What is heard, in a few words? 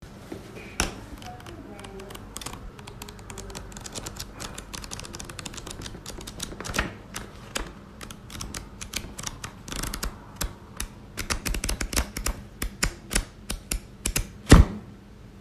Book sounds